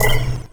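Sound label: Subtle HH, hi-hat; Sonic Foundry (Sony Creative Software); Esoterik Beatz; Subtle Kit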